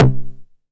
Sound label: 909 SD Tape Sat